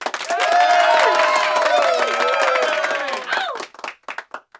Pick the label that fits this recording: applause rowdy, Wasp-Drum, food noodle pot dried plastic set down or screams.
applause rowdy